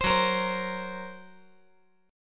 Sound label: F sharp Major Flat 5th; F#Mb5 strummed